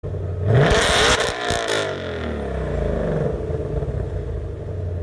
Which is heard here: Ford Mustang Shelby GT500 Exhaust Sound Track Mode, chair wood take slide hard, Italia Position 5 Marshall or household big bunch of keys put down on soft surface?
Ford Mustang Shelby GT500 Exhaust Sound Track Mode